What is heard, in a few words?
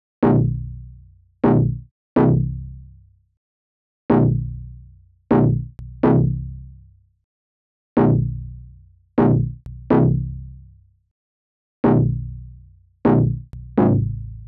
124 BPM E Min Donk; Melody Loops; Loop Stems; Cymatics; Anubis